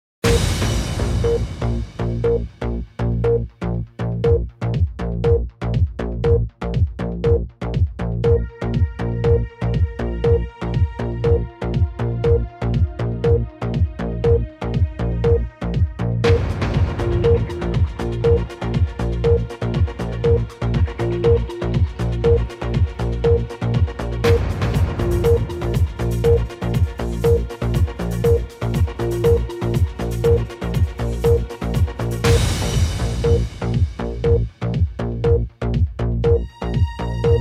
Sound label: Theme Tune; BBC News Countdown